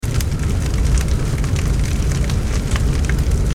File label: fire burn